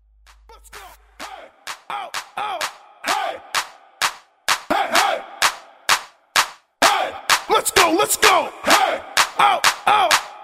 Meto Duro Latin Hype Starter

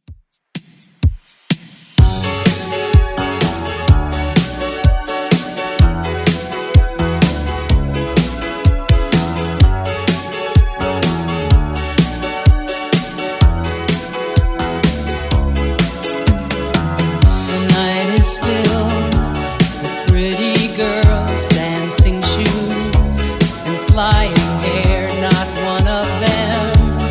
80s Redrum 126bpm

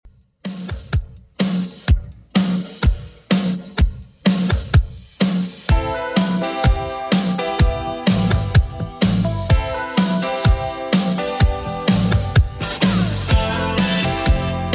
80s Redrum 126bpm

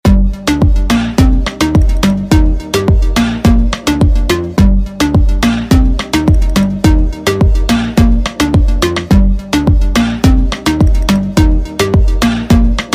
Moombahton; Redrum

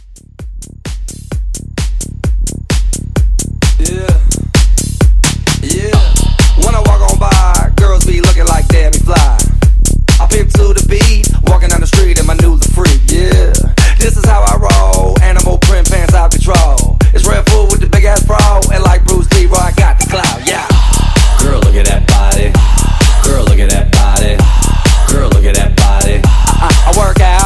Mashup, 130

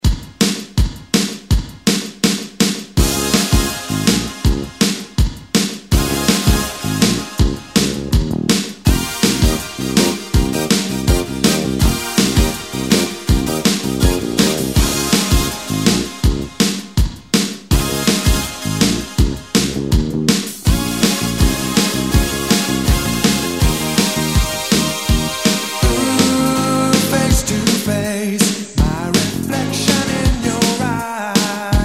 80s extended

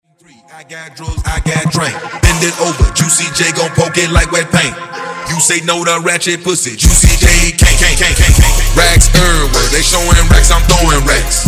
Acap Intro Funk 78 128Bpm; Bootleg

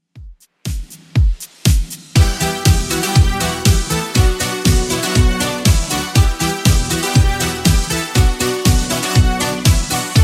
80s Redrum 120 bpm

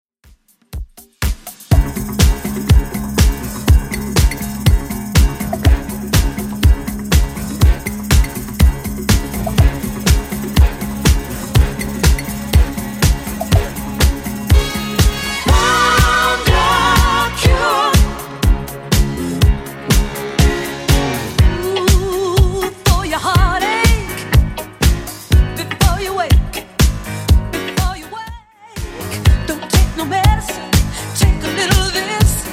70s Redrum 122bpm

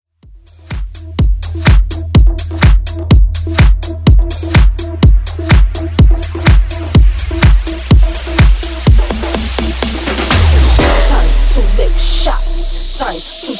Bass House Dancemix 125bpm

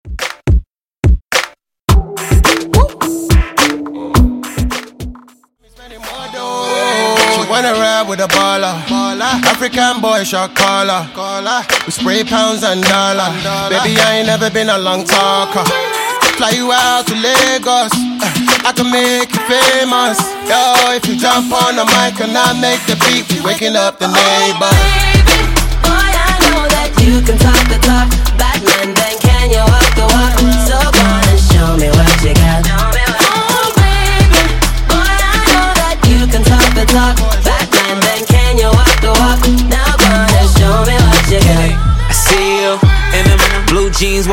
Extended Redrum

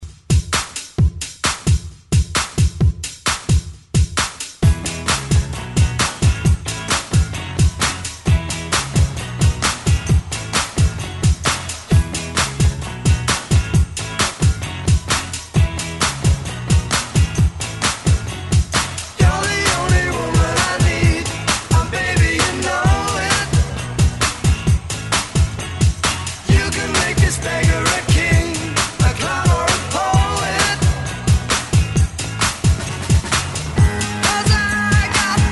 ReDrum 130 Bpm

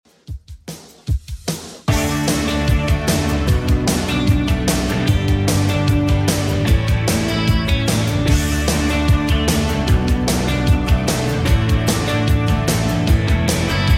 80s Redrum 150bpm

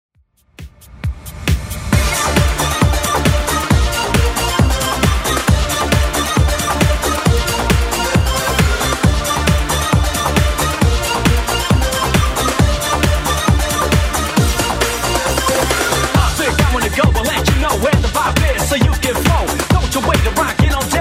90s Redrum 135bpm

